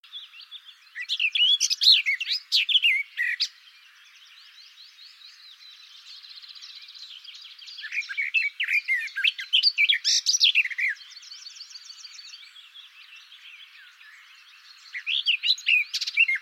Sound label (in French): fauvette des jardins sylvia borin